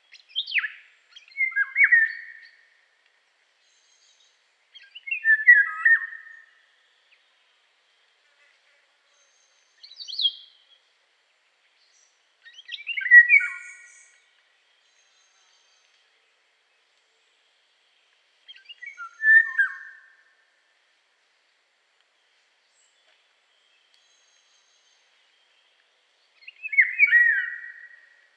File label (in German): Stimme Gesang Ruf hören